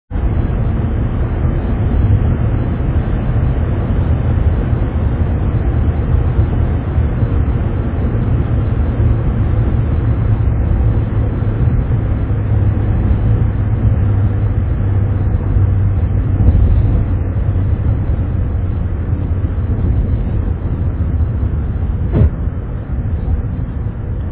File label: saab 900s Turbo